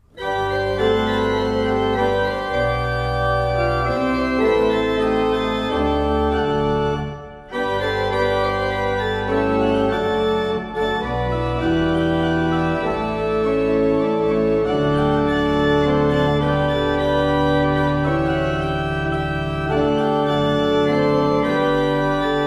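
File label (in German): Rohlfing Kreienbrink 8 II P, pfeifenorgeln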